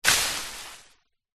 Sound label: bushes leaves hit with wood post; foley